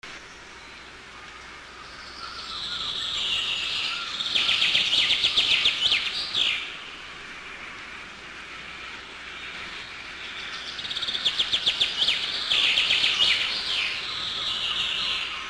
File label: forests british birds